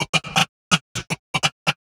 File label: 126 D VOCAL LOOP, Funky Underground; Loopmasters; Funky Underground Vol 2